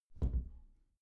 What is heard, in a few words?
glass door close soft